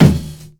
small reverb snare drum sample D key, steel snare drum sample; snare drum samples